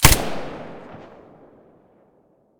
silencer, weapons; vpo101

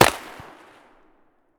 winchester1300 muzzle mono far; mossberg

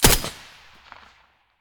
m4a1 silencer mono, weapons

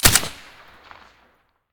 scar silencer stereo

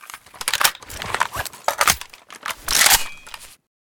ak74 reload empty, weapons; STALKER; IWP

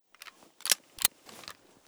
fn2000 gl activate

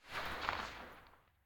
scar silencer mono very far

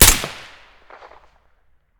svd silencer stereo

